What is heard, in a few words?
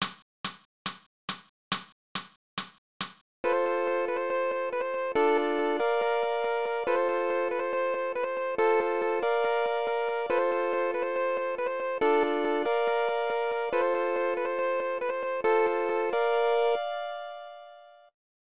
Guitar Line F